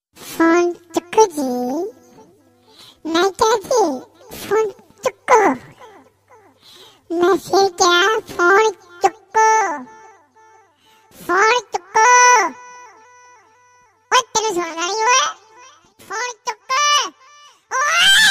funny ringtone phone attitude ringtones love ringtone sad ringtone